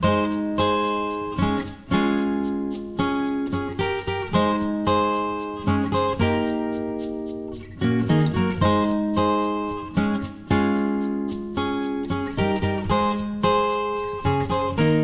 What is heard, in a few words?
BGM Tranquil